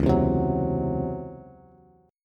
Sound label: Db Minor Major 11th Db down Bass Bass Standard; strummed